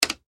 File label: toggle switch